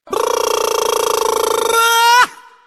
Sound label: Punjabi Barak Brruua